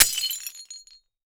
Crashes Glass Impact Debris

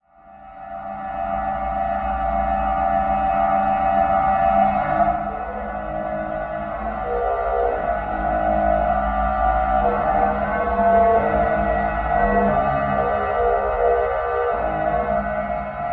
Title Transition Stab Dark Long

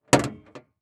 Auto Saturn Pop Open Hood; Transportation

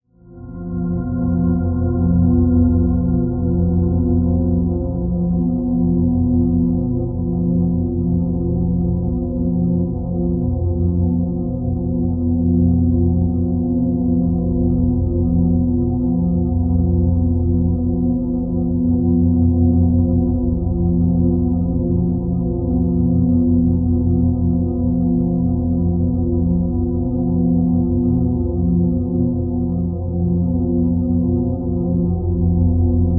Drone Music Constant Fluttering; Production Element Imaging; AdobeCC

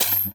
Beep Electronic; Multimedia Internet CD-Rom Flash

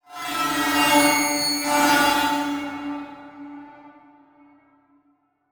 Transition Metallic Warble; Production Element; Title Transition; AdobeCC

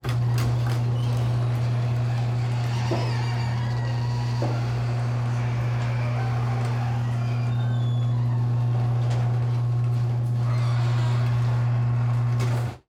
Household Garage Door Close

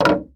Crate Set Down On Boardwalk; Foley

